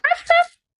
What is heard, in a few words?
bark bark